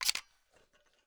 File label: m3s90 load; weapons; garrysmod; m3s90p